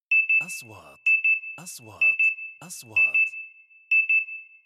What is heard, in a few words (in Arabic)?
نغمة تنبيه خطر; transitions